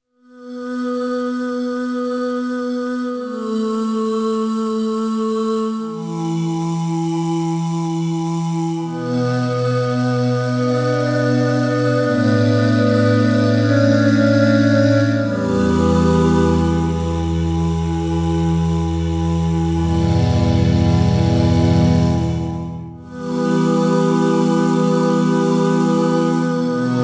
Oo Voices

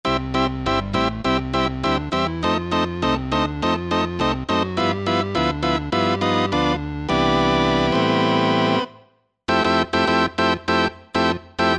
Garage Organ, Korg TR-Rack

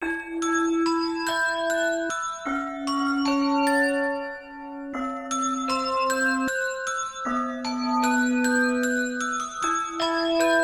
Handbell With Reverb, Yamaha TX16W; Wind Chime & Handbell

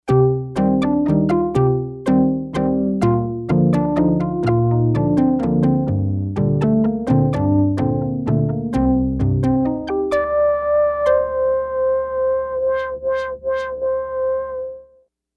Squeak

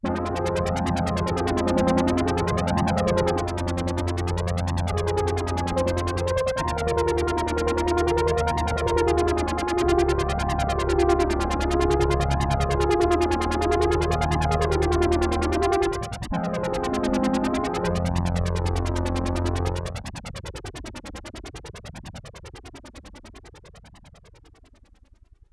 SWEEP, SIEL DK 80; Factory

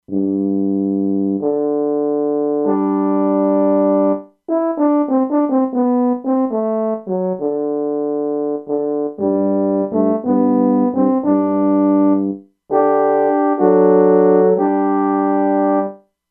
French Horn, E-mu Proteus-2; Factory Presets

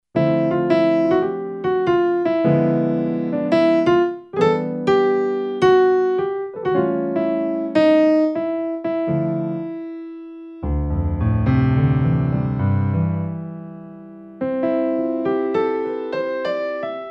Acoustic Piano

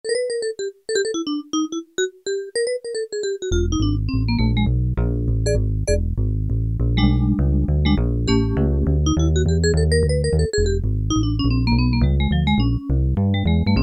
BASS VIBES, Casio VZ-10M; Internal factory combi

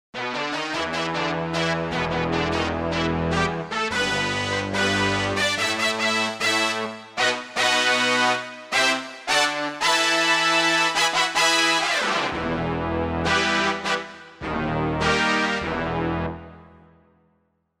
Brass Ens.; Korg MSC-1S Variety

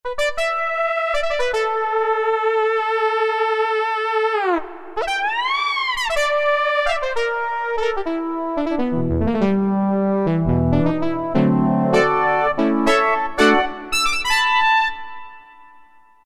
Syn Brass 3, Roland MT-32

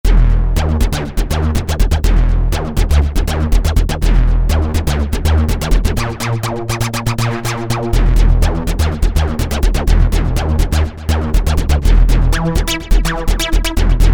Roland JP-8000, Rave Time; Factory Patches

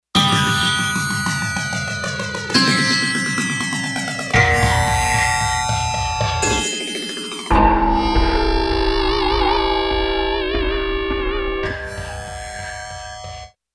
Gated FX

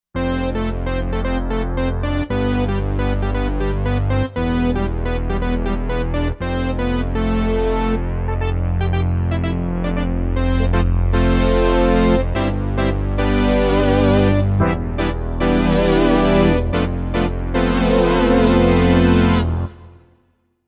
Fat Oct

